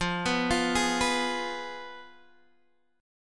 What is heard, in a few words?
F Diminished Guitar DADGAD